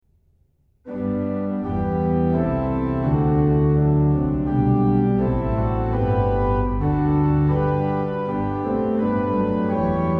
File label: hymn